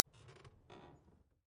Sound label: Under Bed Peek